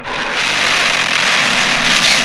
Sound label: toilet scream